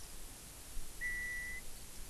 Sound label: hollow whistle